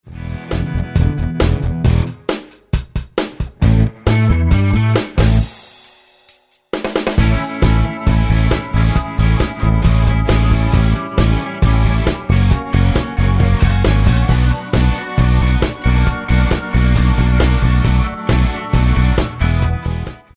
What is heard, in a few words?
Funky Cha-cha